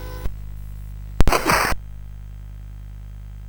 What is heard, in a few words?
sid CSG 8580R5